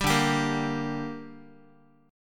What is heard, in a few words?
D Suspended 4th Sharp 5th D Guitar; 10,10,10,8,x,x; strummed; 4A,4E,5A,5D,5G#,6C#